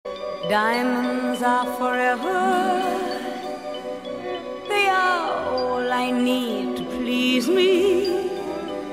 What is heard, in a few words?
diamond drop